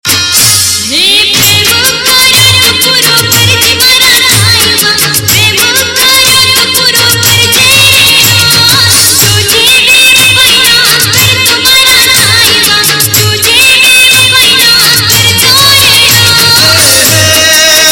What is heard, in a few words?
Gujarati Mix; Gujarati Ringtones